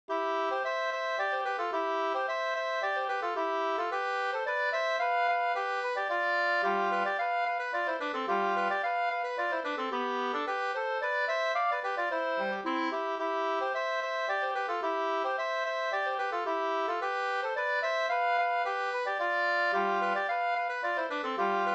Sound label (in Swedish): polska, 2st; August Bohlin Up